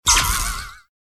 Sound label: Notification Bonus; TankiOnline